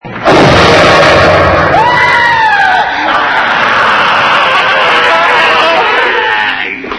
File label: ash scream